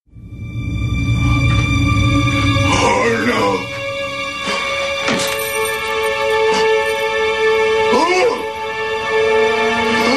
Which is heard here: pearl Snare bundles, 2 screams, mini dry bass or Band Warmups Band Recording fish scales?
2 screams